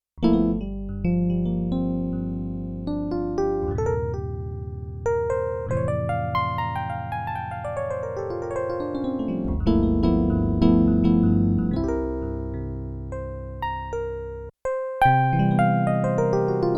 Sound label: Rhodes; General Performance